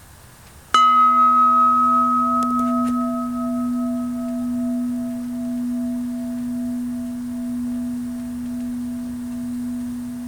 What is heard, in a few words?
chime